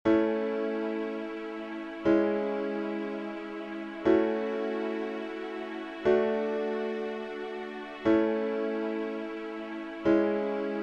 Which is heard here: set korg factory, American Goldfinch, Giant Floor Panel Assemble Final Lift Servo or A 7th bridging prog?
A 7th bridging prog